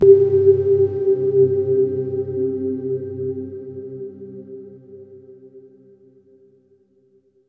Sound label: Exploration Stinger tones